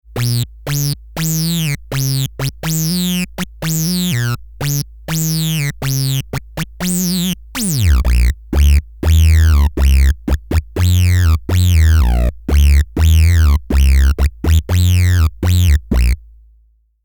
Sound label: microkorg